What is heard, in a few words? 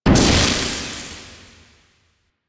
Basketball Net Spawn; Warframe; Duviri